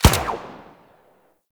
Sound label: Rifle Fire; Warframe; Venus; Venus Jackal; Weapons